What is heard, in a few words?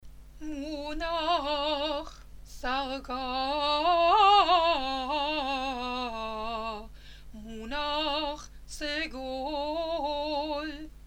munach sarqa; munach-Zarka-munach-Segol (Haft); Torah